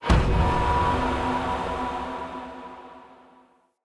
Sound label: Puzzle Rune Hit D; Circade Community